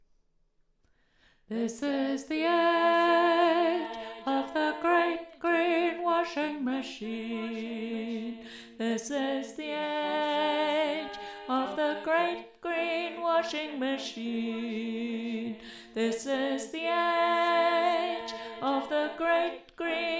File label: great green washing machine tune